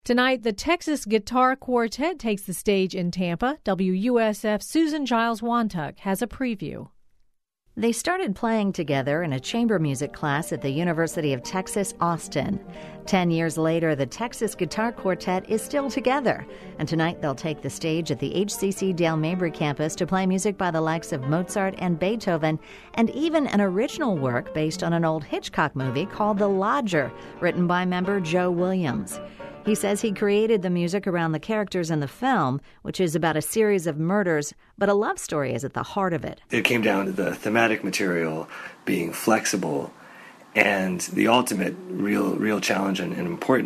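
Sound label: Texas Guitar Quartet with Lead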